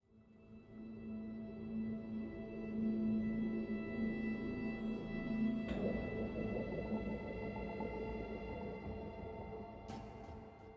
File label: Military High 85bpm